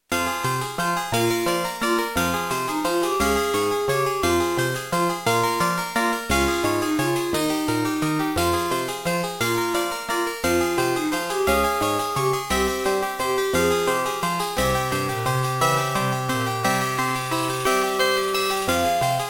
Track 6, Beepblaster 2.0 SYNTH MODE; Death Gate

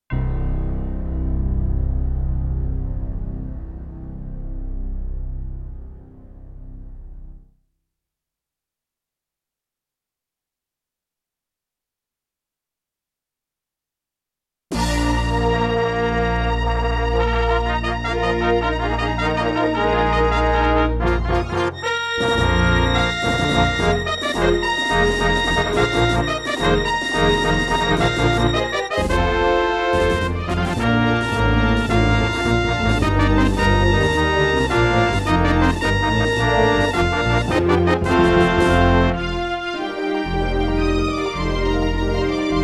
Intro, Roland XV-2020; Dark Forces

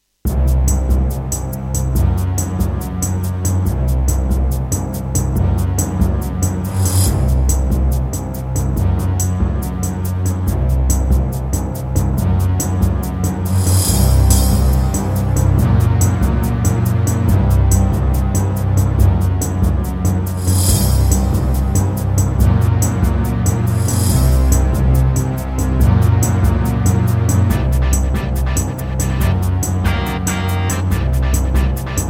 MidiPlus MiniEngine USB; Heretic

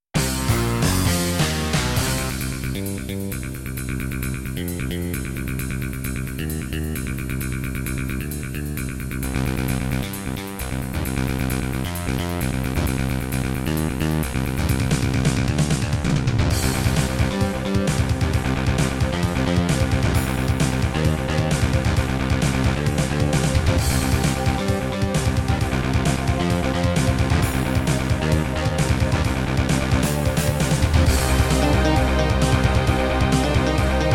Kawai GMega; sigil doom episode 5

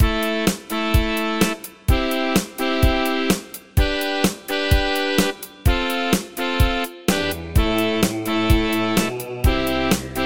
Wave 1 [Yamaha SHS-500]; Raptor

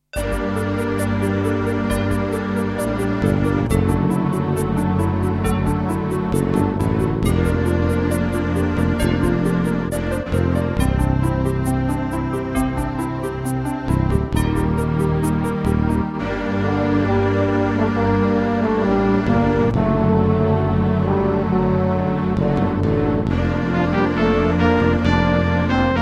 Terratec WaveSystem SCW001-2; Quest for Glory 4

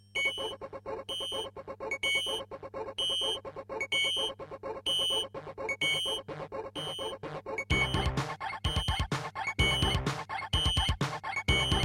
Intro, Diamond Monster Sound 2MB; System Shock

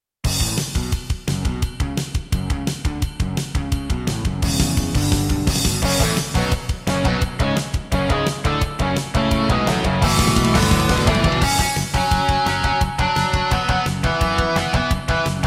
Intro, Roland JV-1010; Duke Nukem 3D

